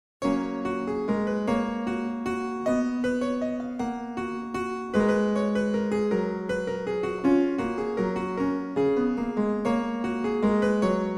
Early Pianoforte, Menuett; Bach

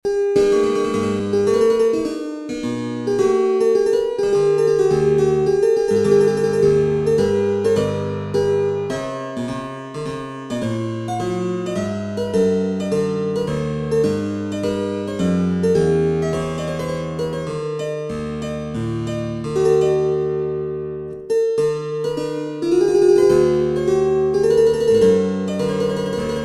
English Spinet, Corant aus Suite in a; Henry Purcell

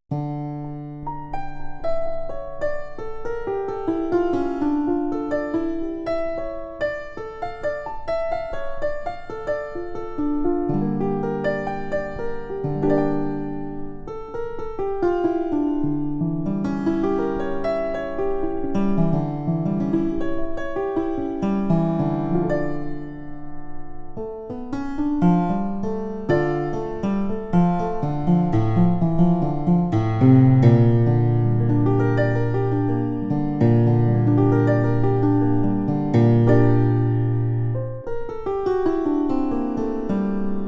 Lutheal Piano, Clavecin Stop; Haendel; Praeludium; edition mim

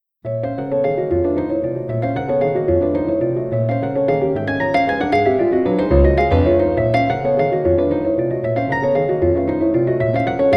Swiss Grand 1860; realsamples; Steffen Fahl; Emile-Blanchet-op7-2

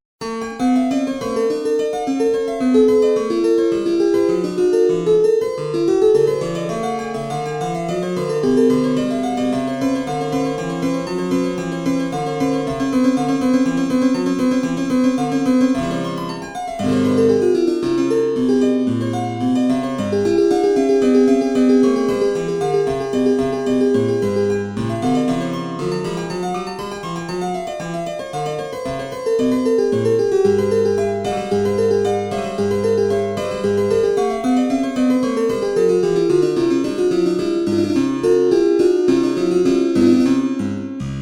English Spinet; Henry Holcomb; Study b

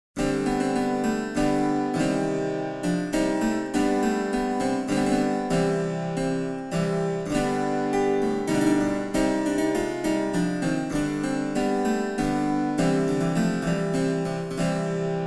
German Harpsichord Rear 8; German Harpsichord 1741; Rear 8 Demo; Excerpt from My Ladye Nevels Grownde by William Byrd; edition organeum

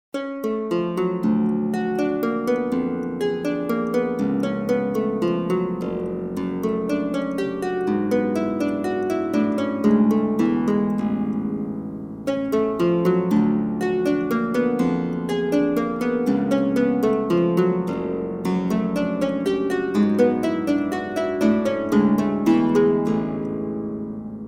Sarabande, German theorbo-harpsichord; J.S. Bach; bwv995-d

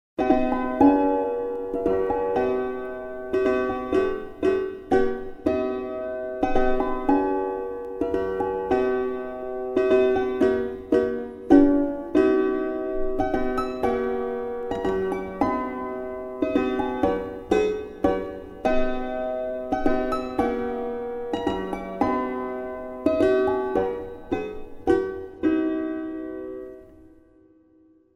Orphica Piano; Schubert

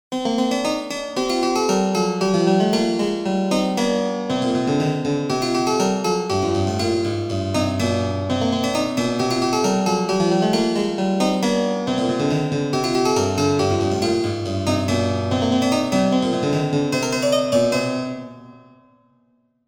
Italian Harpsichord; Adriano Banchieri; Bizaria del 1 Tono; 1622

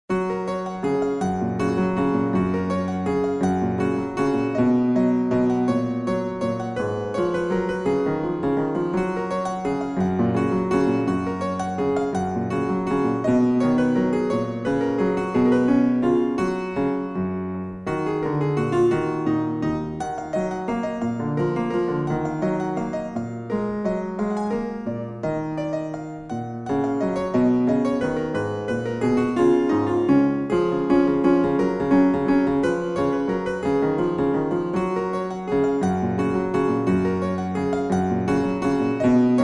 Early Pianoforte, Menuett; Bach

